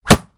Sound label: crossbow shoot; Minetest; VoxeLibre